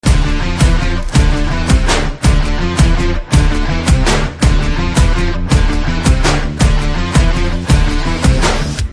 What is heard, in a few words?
Slow Motion Theme Ringtone